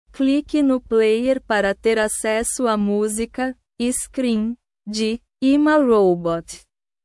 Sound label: scream